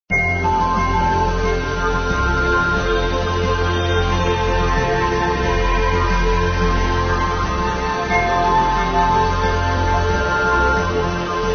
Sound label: Snow Vania Music; Super Adventure Pals